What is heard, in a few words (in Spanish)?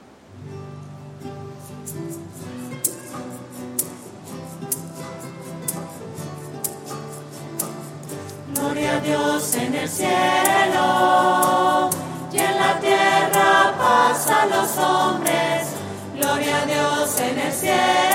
gloria voces; misas 2012